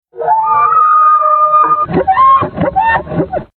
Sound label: Elk Bugle Adult; ORION